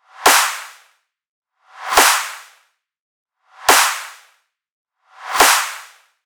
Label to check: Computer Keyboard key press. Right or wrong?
Wrong